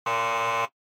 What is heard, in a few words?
Electromagnetic Buzzer, Dry